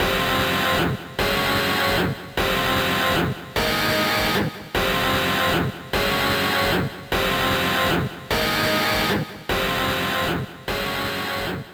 Guitar; Guitar Study Creativity 2

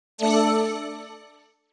Dice Decide

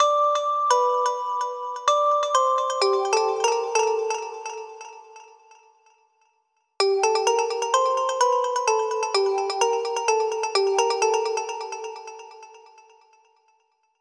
Melody; super crush k.o.

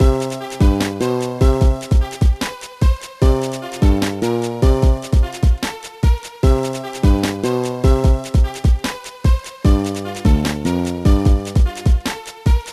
Unused Shop Theme; The Sims 2 Pets

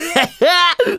Emote Laugh; Target Hungryfrogs

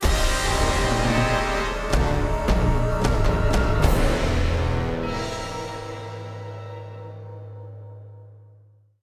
Quake; Bolt

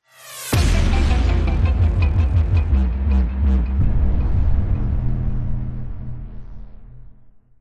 Mission Failed

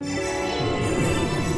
Clockwork Machines E Ambient

